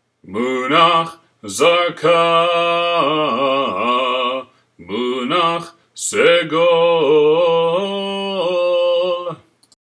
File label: Haftarah Zarka, trope; sinai